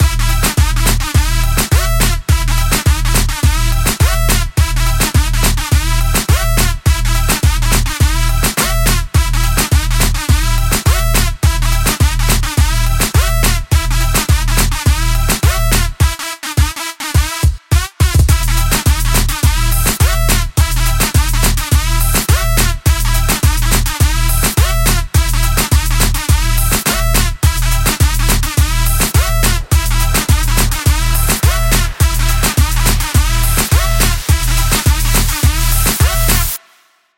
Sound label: Kit Loop Full